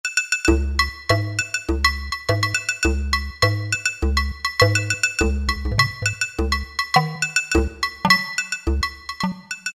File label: Samba Drum n Agogo, Roland PN-D50-02